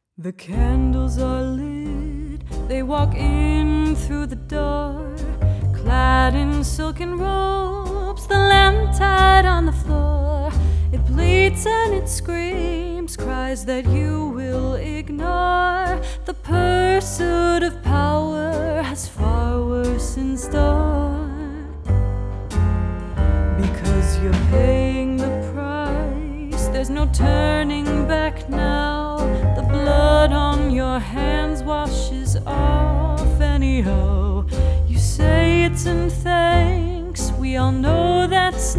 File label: Cadence's song ""